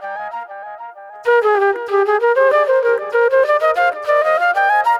NY 70's Delay Flute; Sonic Foundry (Sony Creative Software); Manhattan Flutes; Session 01 - Benedetta

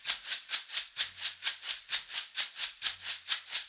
SHAK, Percussion Loops; USB Soundscan vol.36; AKAI

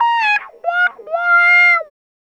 Lap Steel; Trip-Hop

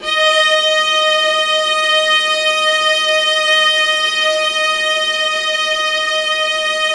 D#3, Ultimate String Collection, VLAS EXP; LEG

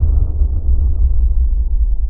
Low end rumble; musicradar

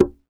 Dance Drums

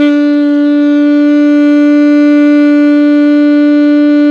ALTO PP D 3, Sax; 4-WAY

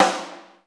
AMB.S; Crypton - DrumBase5000

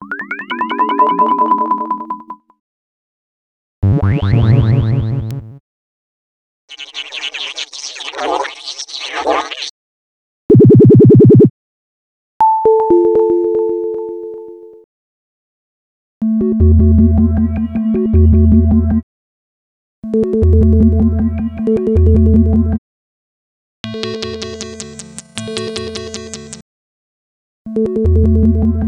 Electro FX; X-Static Goldmine 5

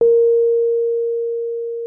Rhodes MK1 A#4; Xsample VOL-1

